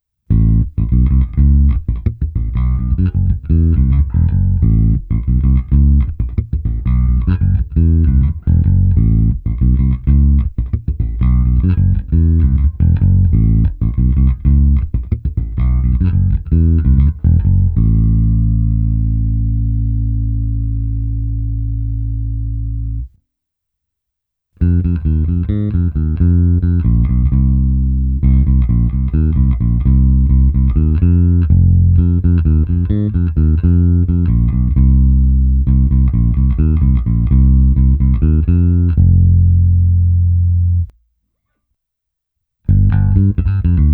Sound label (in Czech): Nahrávka přes Darkglass (RW), Mike Lull V4, baskytara